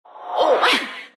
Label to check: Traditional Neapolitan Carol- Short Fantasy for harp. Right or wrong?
Wrong